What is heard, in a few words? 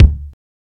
prominent kick drum sound d key